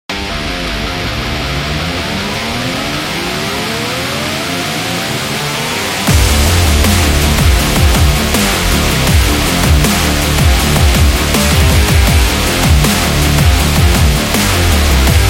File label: Rock Dubstep